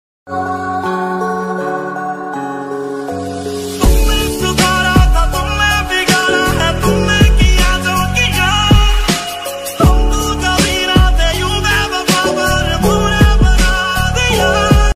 broken heart sms ringtone, sad love story sms ringtone